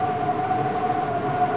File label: wail, wind